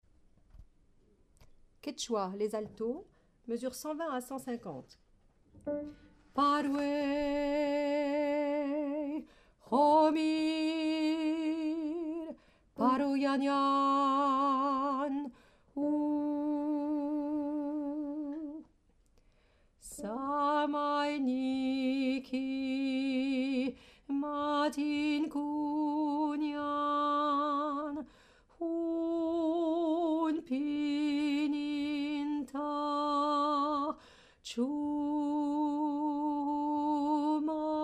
Alto; Quechua

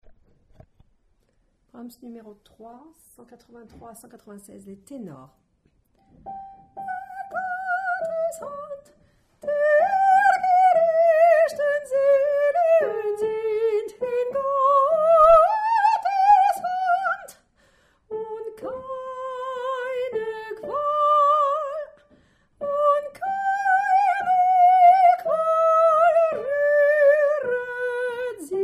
Tenor

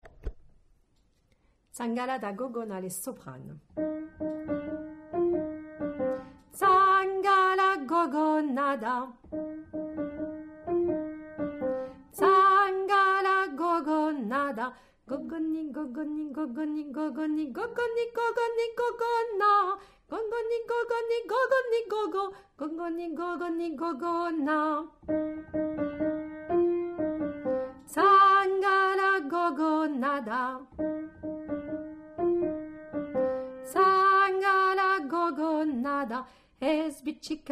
Soprano; Tsangala da Gogona

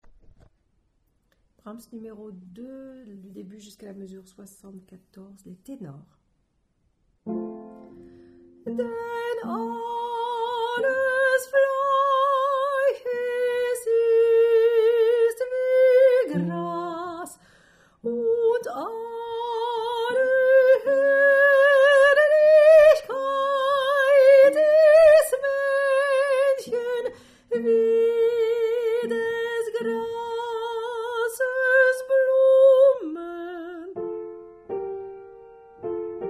debut Tenor

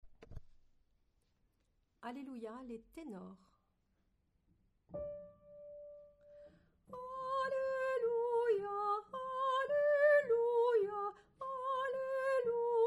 Tenor

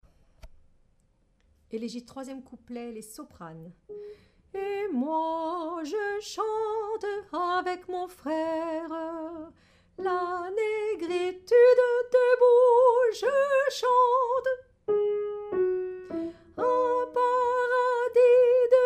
Soprano

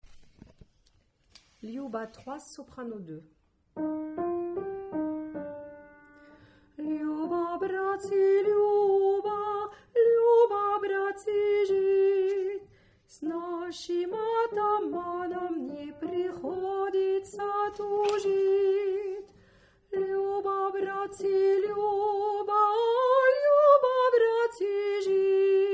Soprano

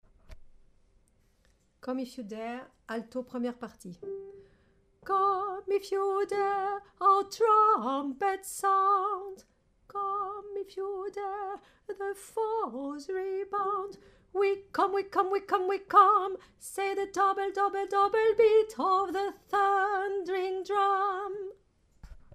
Alto

